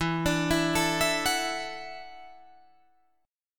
E 7th Suspended 2nd Suspended 4th E down Guitar Drop D